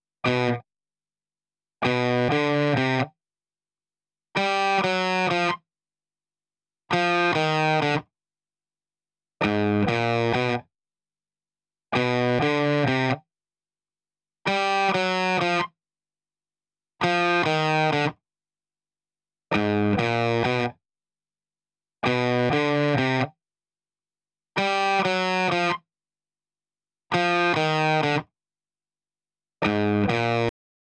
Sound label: DRIVE STEREO (Right)